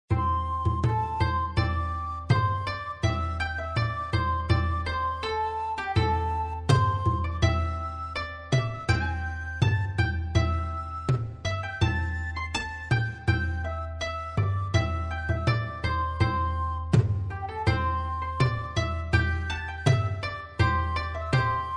Title Music; sushi cat

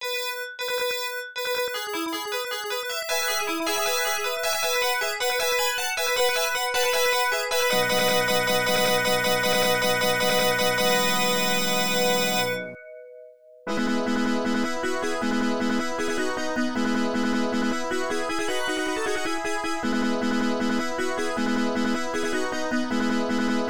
Bonus Stage BGM, arcade; Wally wo Sagase; System 18

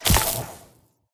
electric pole charge; ConduitSFX

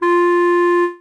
Clarinet tone; LEGO My Style Kindergarden; macOS; Windows

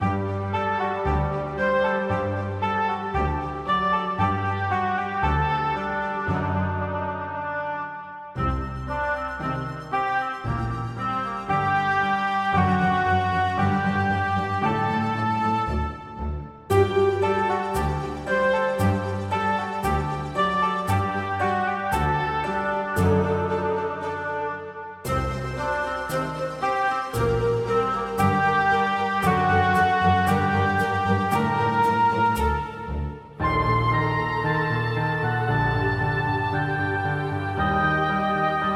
Steampunk Basement

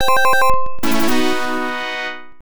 Quiz - Correct Answer!

